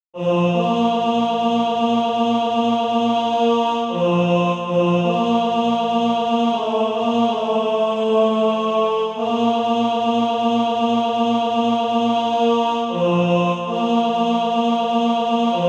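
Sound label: tenor, Practise Music; SATB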